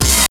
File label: HIT, STABS, House